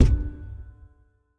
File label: reel stop; fairyred